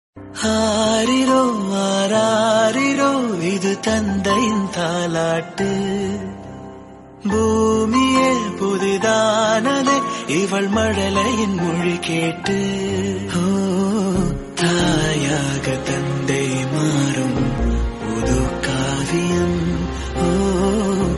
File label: Father And Daughter Song Tamil; ringtone